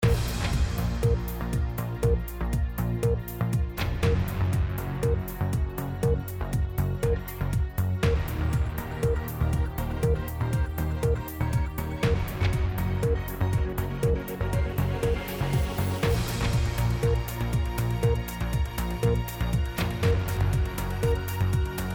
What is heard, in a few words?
Electrocore Countdown Mix; BBC News